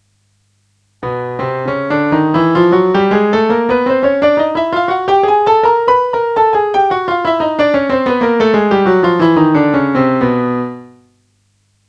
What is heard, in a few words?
Chromatic scale from B